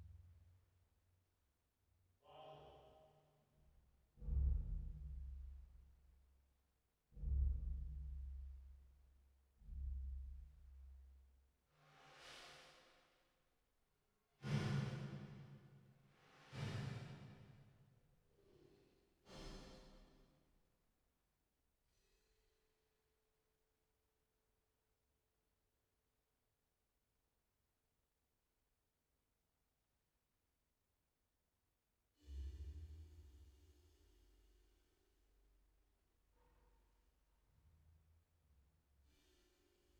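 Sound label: 480 Reverb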